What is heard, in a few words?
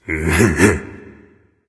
heh heh, voice; Smite; ymir skin12